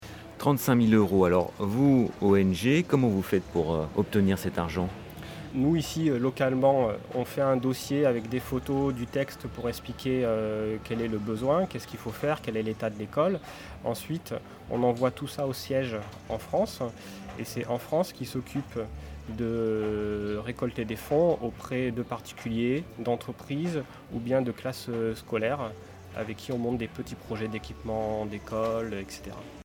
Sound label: EDM